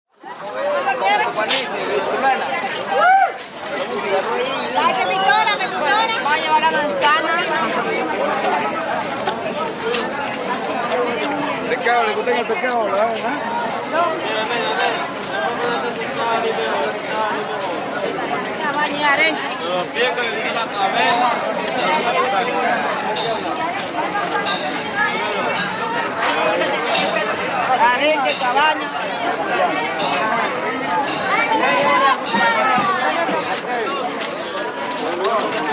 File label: Outdoor Market Busy, spanish